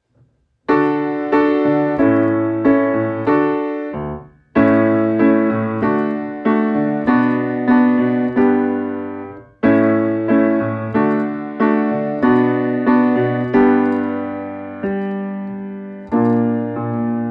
Haeuptling Adlerauge, Klavier

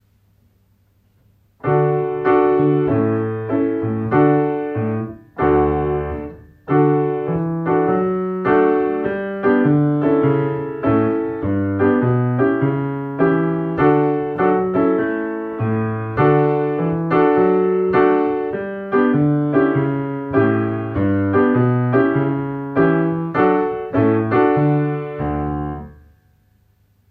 Die freche Maus, Klavier

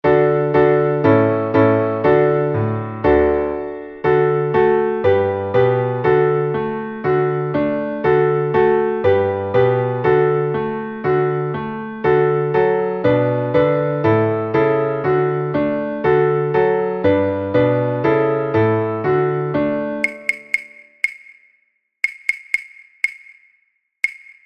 schnell Klavier; Backe backe Kuchen

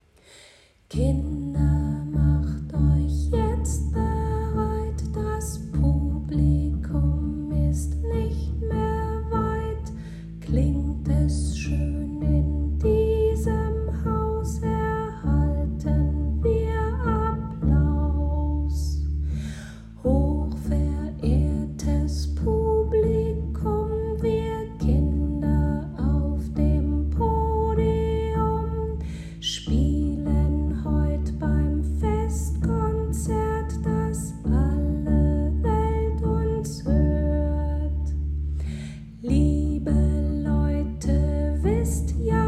Das Konzert Bass pizz

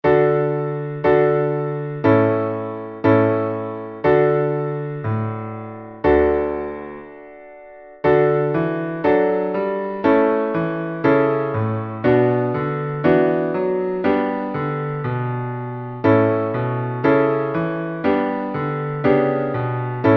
D Dur Tonleiter langsam Klavier

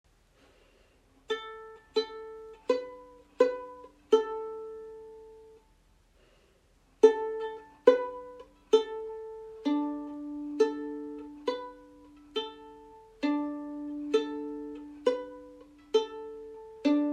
Geige pizzicato

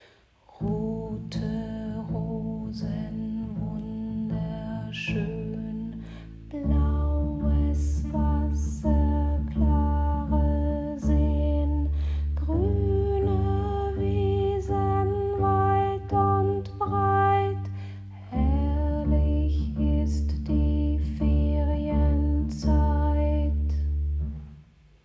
schnell pizzicato Bass

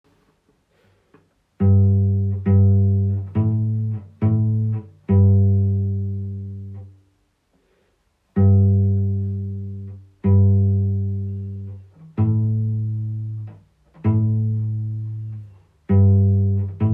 pizzicato, Cello

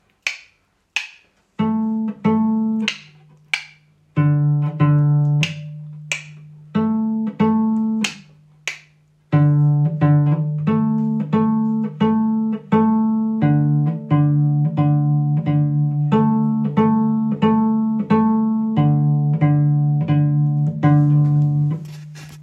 Cello pizz

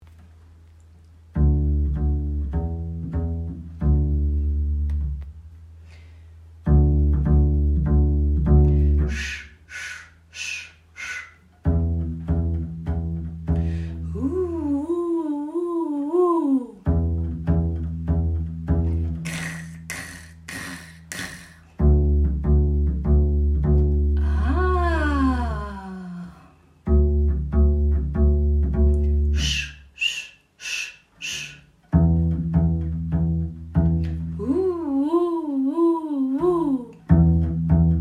tango Bass pizz

